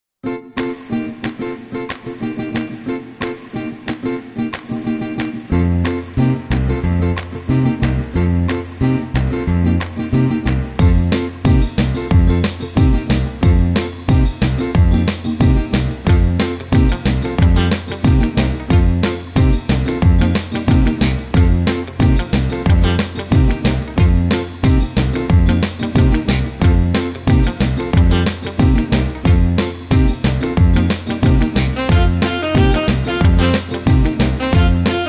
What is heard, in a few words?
brass minus